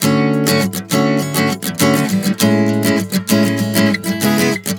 Dmaj7-C#m7 100 bpm, acoustic guitar chord progressions